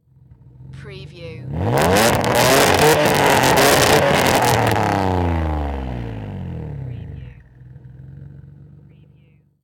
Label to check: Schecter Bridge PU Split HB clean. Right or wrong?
Wrong